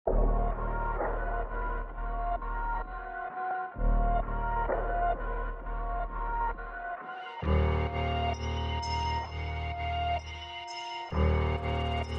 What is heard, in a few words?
Dark Revers 130 Bpm, D#m